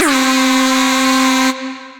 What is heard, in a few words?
Duckspeak, Synth Lead